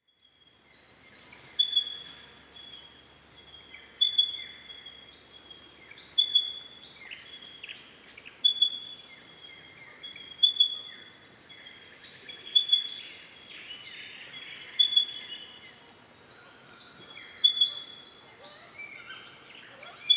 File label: Pardalote, پرنده